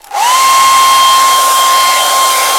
BLOW DRYER; Greytsounds; HOUSEHOLD FX

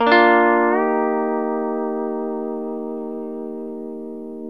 A# TO D#, Pedal Slides

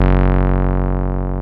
303 SQR F#1, ROLAND TB303; Big Fish Audio; First Call Vintage Keyboards

